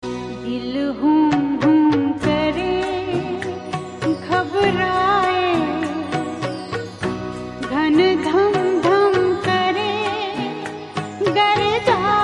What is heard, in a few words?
Old Hindi